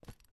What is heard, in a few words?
jump